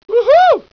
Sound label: uhuu